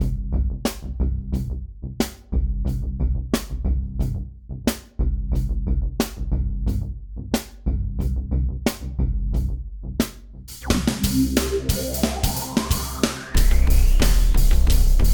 Power Bassline

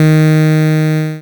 Game Fail

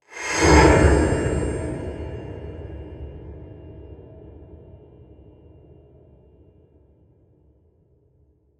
Eerie Metal Tense Hit With Lots Of Modulation

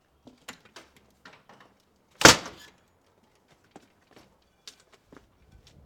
Screen Door Slam

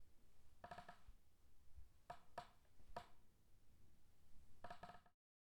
Squeaking Chair

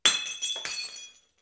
Cup smash

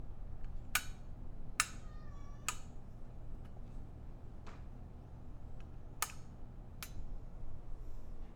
Lamp

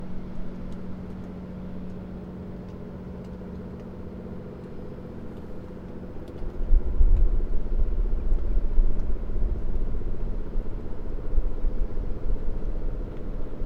Diesel

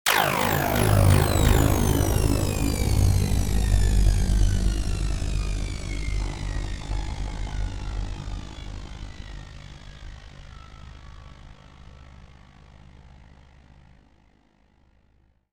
Alien Gun

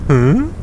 "Huh", Grunz

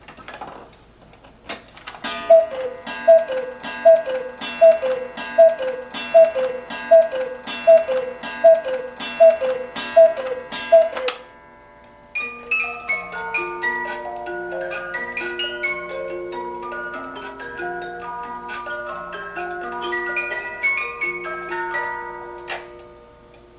Exquisite Musical Cuckoo Clock

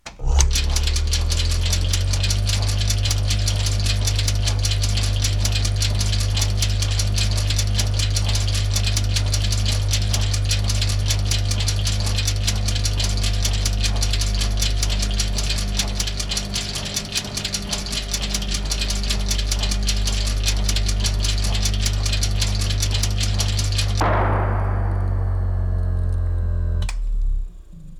Car lift

